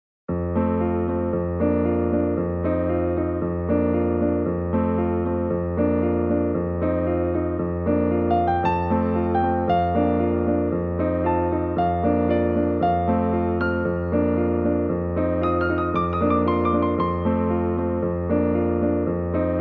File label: piano; maoudamashii